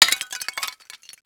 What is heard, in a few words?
tile hit; projectiles; bullethit; Max Payne